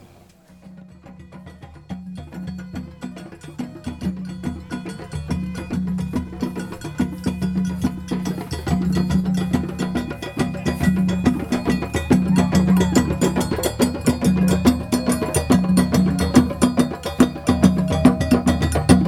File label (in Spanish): Mas Tambores; mexico 2005